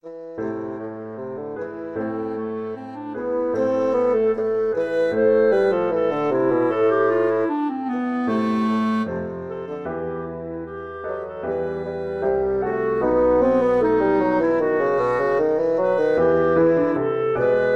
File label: clarinet bassoon duet